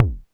polyvox bassdrum; Formanta Radio Plant

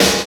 SNR TRASH, snares; Roland L-CD701; Snares 5; Sn Modules 5

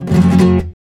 RASGAND G MJ, FLAMENCO; FLAMENCO FX; Guitars; Spectrasonics; Hans Zimmer Guitars VOL-2

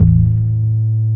DR. LOOP, BASS; X-Static Goldmine 3

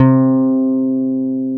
FEND P-BASS C3; Sonic Reality - Vintage Timetraveler

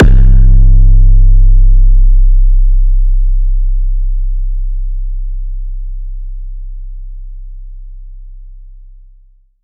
boom, Kicks; ModelCycles

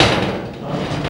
DOOR AMBIENC

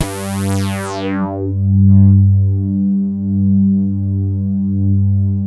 reso pad; Sound Gallery - Charged

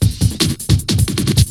BREAKBEATS, TECHNO

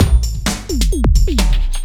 Beat, Drums & Percussion; Electrocution; Beats

